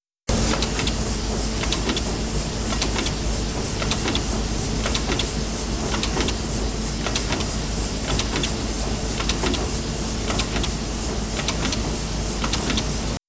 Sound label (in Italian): Macchina a vapore